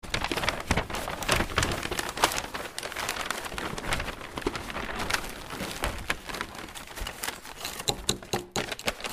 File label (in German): folienrascheln